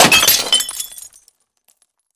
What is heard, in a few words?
weap molotov bottle; gmod; viper